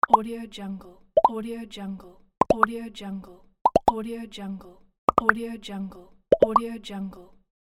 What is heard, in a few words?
multi pops